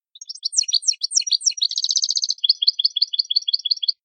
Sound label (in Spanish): c terminacion; campero